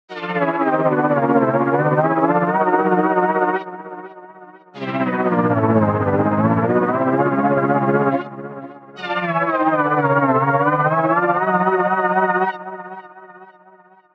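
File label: sequence night sky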